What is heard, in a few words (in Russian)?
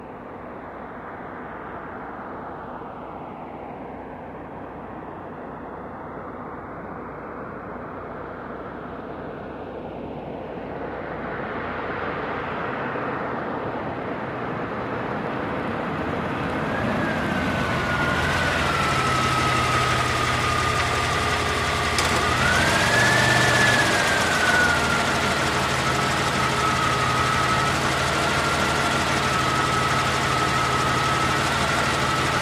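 priblijenie avto, approaching car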